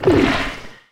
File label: paint blob inception; Portal 2